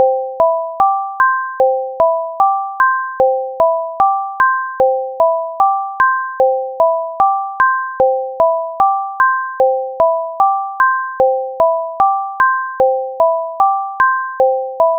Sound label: flute; kantara